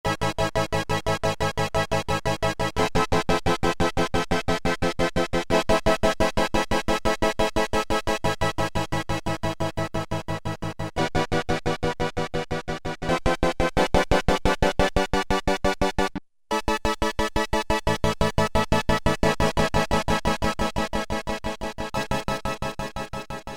TRIG PULSE WIDTH, Oberheim OB-8; Factory Patches

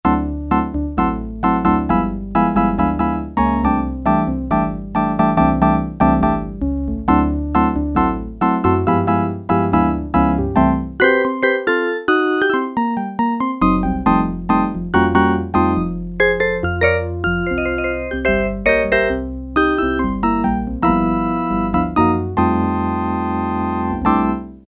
ELECTRIC ORGAN, Yamaha PSR-300; Voices

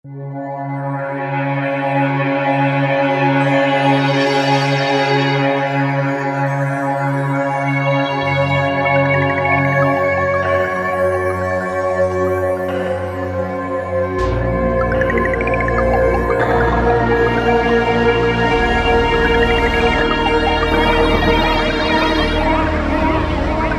Horror Film, synth

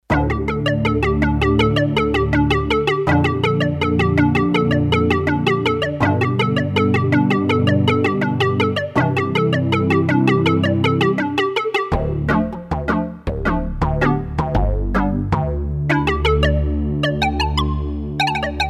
Inner Wood, Roland D-10; Factory Patches

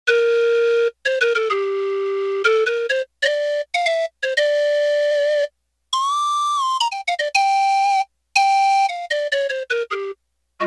Steamer, Roland W-30; Factory Performance Patches

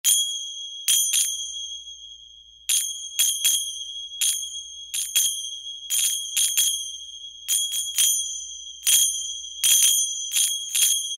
Arabic Ashut, Yamaha A4000 Arabic; World - Latin Instruments; Arabic & Gamelan

